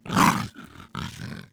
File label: attack